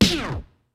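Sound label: HIPHOP KIT, DRUMS; Kid Nepro; Elite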